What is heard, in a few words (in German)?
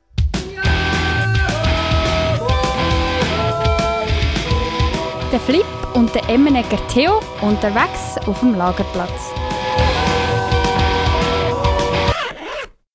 Jingle; Flip und Theo